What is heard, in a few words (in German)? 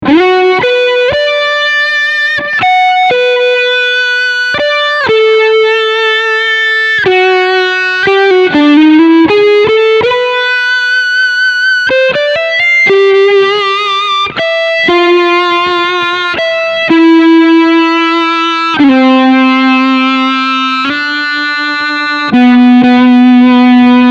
Sustainiac Hals SC 2